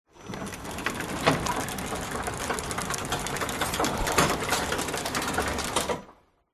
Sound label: household garage door wooden electric motorised open or close short half